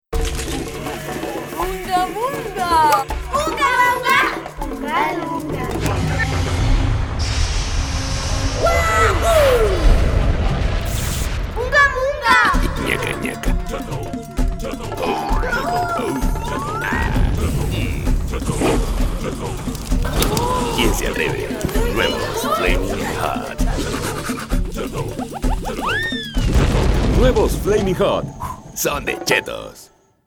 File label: Jingle; Chetos